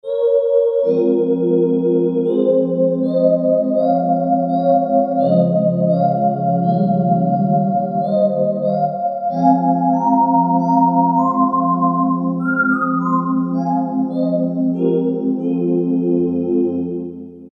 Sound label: Synth Choir, Roland U-220; Factory Patches